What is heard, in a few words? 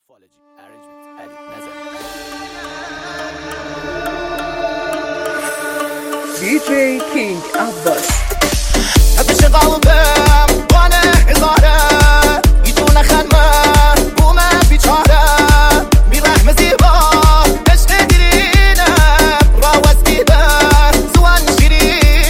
remix